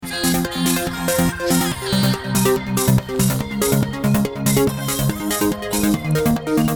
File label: Synth Pumping